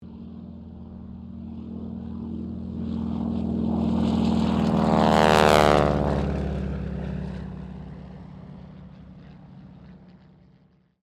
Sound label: AIRCRAFT AT-6D HARVARD MK III TAKEOFF STEREO; Temora Harvard MK III; Airplanes